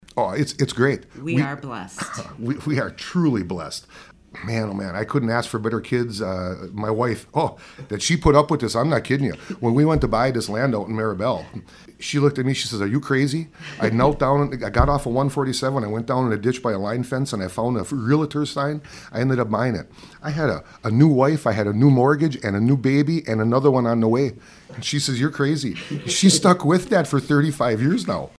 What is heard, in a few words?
Drum